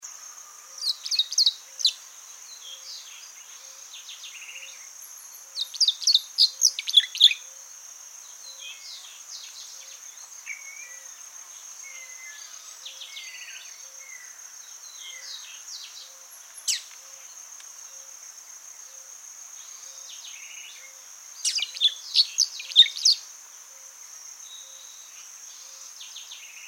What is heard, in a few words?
Brown- Chested Martin; Golondrina Parda; Phaeoprogne tapera